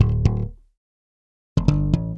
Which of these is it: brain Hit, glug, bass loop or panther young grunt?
bass loop